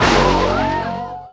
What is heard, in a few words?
Sound Magic